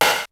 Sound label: DANCE KIT, DRUMS; Kid Nepro; Elite